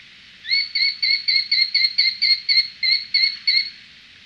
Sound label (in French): epervier brun, chant